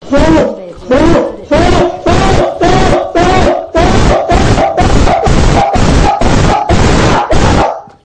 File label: panic mode